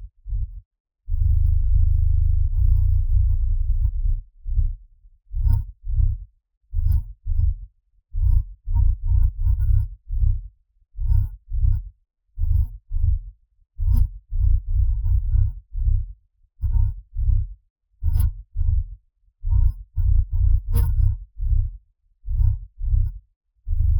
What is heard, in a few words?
bass; SiSEC11